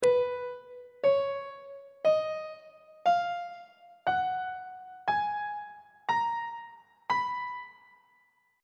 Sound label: b lydian mode note positions on treble clef